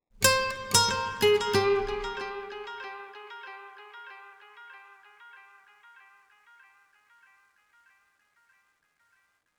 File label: Oneshot Acoustic Guitar; zombie army 4 dead war; ps4 stadia switch windows xbox one